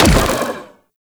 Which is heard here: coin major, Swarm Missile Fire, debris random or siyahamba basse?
Swarm Missile Fire